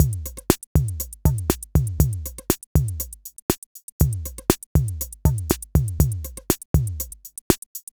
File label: Electro80 Beat, Drumloops; Dr. Fink's Funk Factory